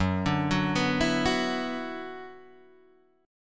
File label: F sharp 7th Suspended 4th Sharp 5th; F#7sus4#5 Chord {2 5 4 4 5 2} arpeggiated